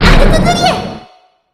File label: cannon boom; celica